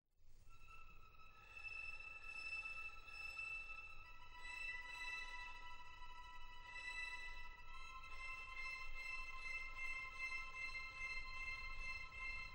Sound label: Celesta; Cinderella